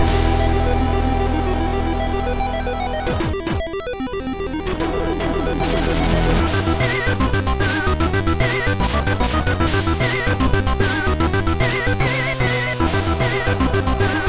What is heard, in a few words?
music finalboss loop